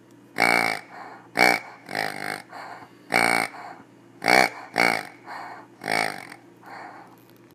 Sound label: Pig